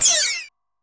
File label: weapons